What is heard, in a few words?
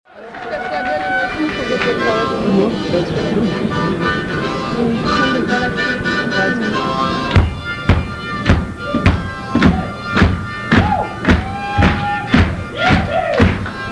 electric torch solo